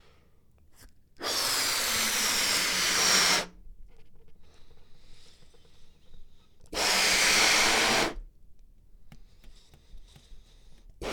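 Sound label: Balloon Inflating And Balloon Squeak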